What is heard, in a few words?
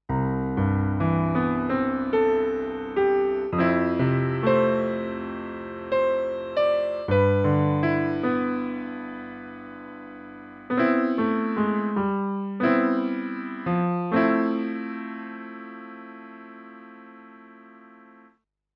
Roland S-330 Mellow Piano